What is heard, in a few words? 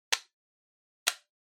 Outlet Timer Switch; コンセントタイマー02-11(スイッチ); タイマー機器